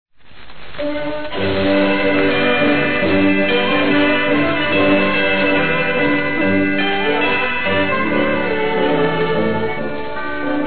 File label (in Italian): Orchestra, Campane